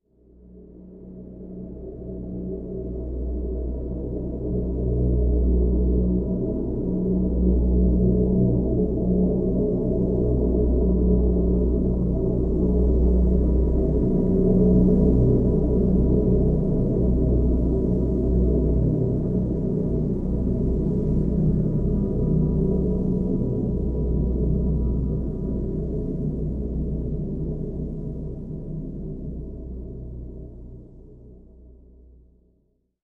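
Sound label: Drn B, drone; Drn River Crossing B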